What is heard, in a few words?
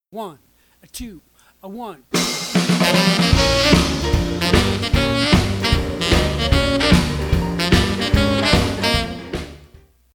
lick swing